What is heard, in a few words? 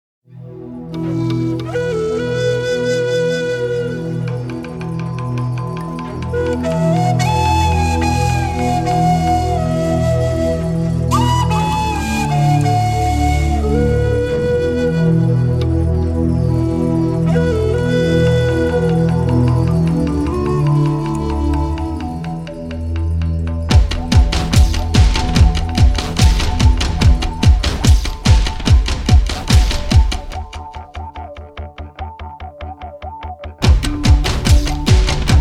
Folk dance